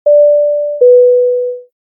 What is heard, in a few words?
airplane seatbelt beeps; OpenValley